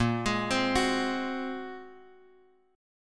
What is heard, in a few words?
A sharp 7th Suspended 2nd Sharp 5th, Guitar, Drop D; 8,11,10,11,x,x; down